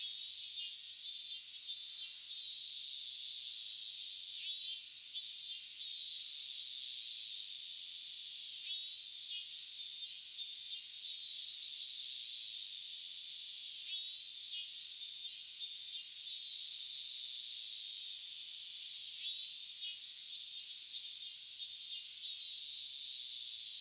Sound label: Voice Of Cicadas; LOOPERS